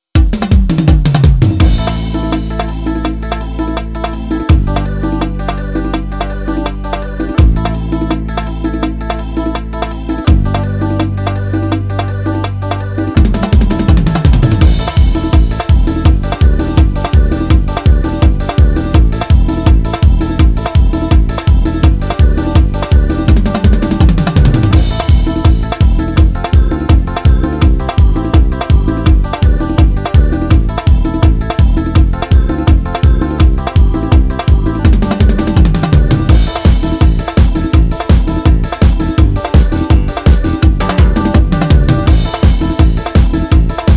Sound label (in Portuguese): eletrico axe; Yamaha